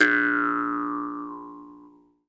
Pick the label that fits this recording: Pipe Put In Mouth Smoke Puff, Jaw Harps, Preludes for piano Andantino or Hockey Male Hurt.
Jaw Harps